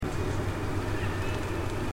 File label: mystery call